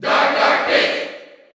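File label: Cheer Dutch, crowd; Dark Pit; SSBU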